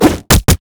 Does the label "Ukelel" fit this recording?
No